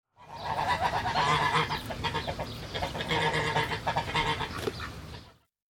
dierengeluid

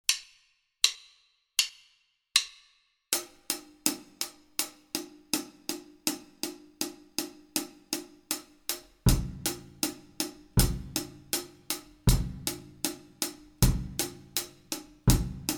basisritme drummen

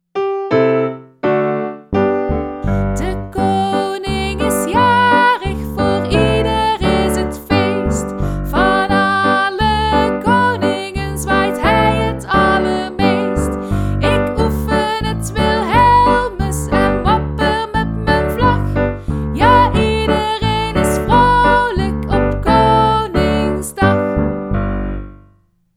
met zang

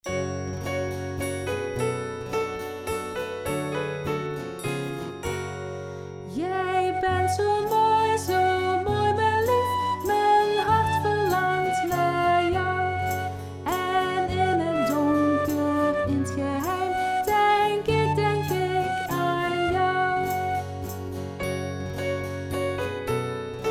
hoofse liefde

